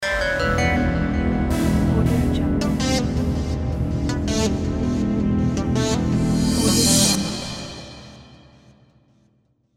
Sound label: electro mystery logo reveal; spacestockfootage